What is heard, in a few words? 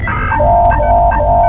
Warning